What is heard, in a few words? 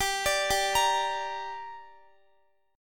G Minor G down Guitar, chord; Gm arpeggiated